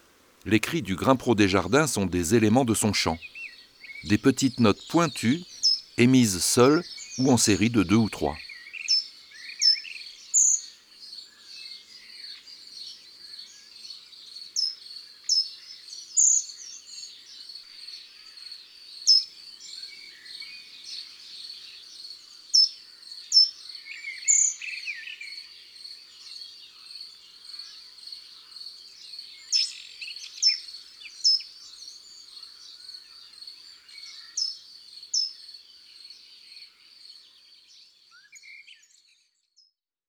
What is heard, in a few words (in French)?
Grimpereau des jardins